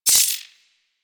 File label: Glass Shotgun Shot Prime Layer B; Warframe; Tenno; Weapons